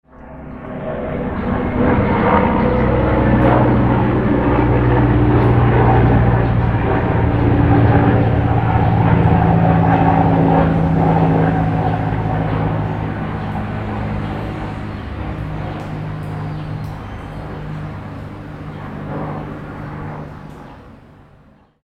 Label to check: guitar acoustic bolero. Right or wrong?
Wrong